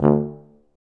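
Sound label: brass C tuba, staccato; Solo Brass; Roland LCDP12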